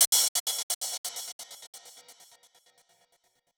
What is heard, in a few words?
Gated Cymbal; musicradar